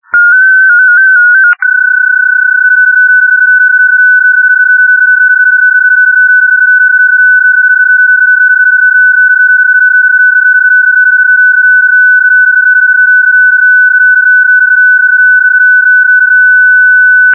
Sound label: PSK31; modulation